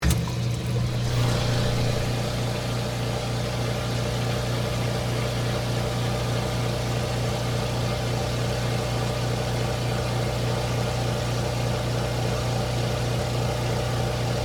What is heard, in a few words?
Soda Fountain, Compressor, On, Run, Off; Soda Guns; BlastWave